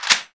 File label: double shotgun load